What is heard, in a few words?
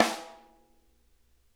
rim mf L, snare; Sonic Reality; Interactive Drum Kits